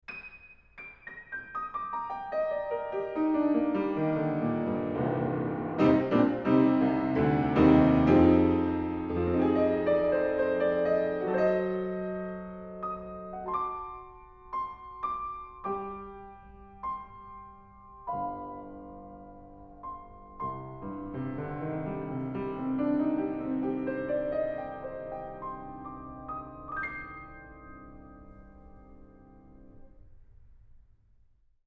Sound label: Piano, Horiz Half Open Mic